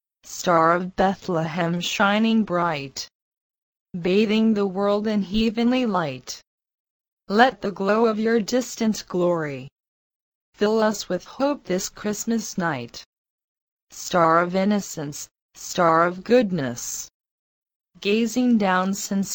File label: diction soprano